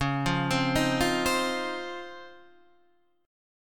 C sharp Major 9th, Guitar, DADGAD

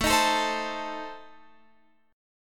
A Diminished, Guitar Open F; x,16,15,16,15,16; strummed; JGuitar

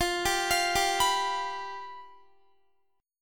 F Suspended 2nd Flat 5th, Guitar; Fsus2b5; arpeggiated; x,20,19,22,19,19

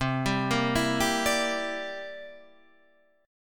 G Minor 6th C; Gm6/C arpeggiated; JGuitar

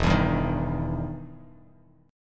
E Minor Major 7th E down Bass Standard; EmM7; strummed; JGuitar

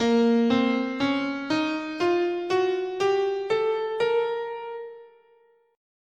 A sharp Bebop Melodic Minor, scale

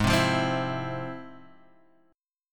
F Minor Major 7th, chord

